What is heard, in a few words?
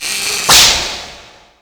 damage; debeste